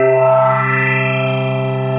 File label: Lead, Synthesizer Middle C Notes